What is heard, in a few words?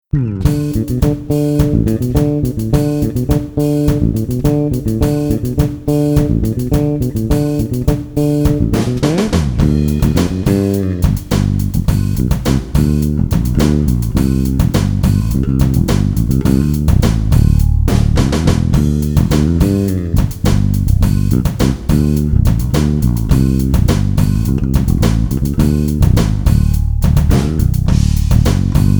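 bass and drums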